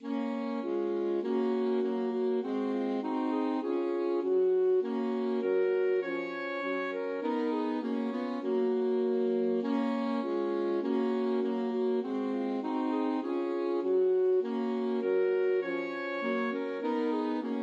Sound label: alto sax trio